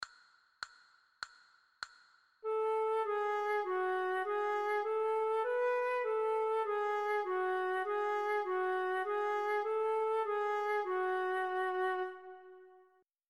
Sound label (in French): Sol diese, flute a bec